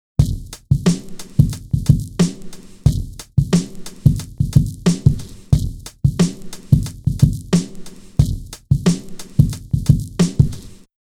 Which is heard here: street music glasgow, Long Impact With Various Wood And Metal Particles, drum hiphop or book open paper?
drum hiphop